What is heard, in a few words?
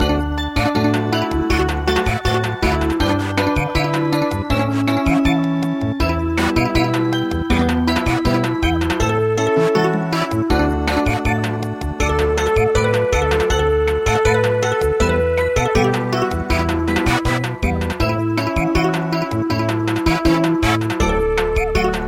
Samba